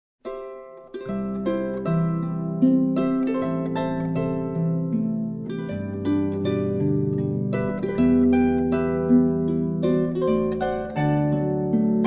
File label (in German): Menuett